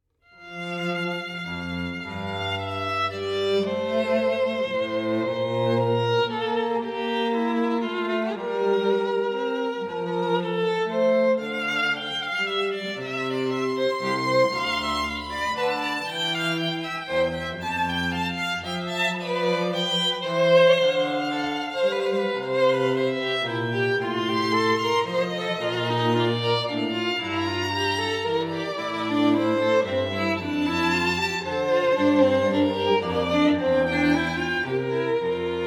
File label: Adagio for Two Violins in d minor; J.S. Bach; Charlotte Strings for Events